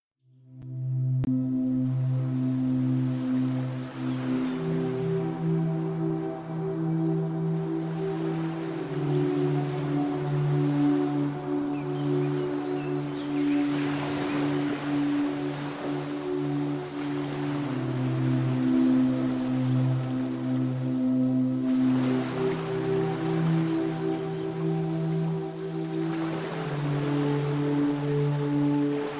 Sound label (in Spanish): musica fondo